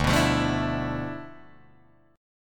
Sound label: D Minor 6th Add 9th D, Guitar, Drop D